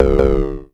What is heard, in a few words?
Klone Dual Percussion Synthesiser tom